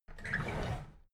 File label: drawer big squeak open heavy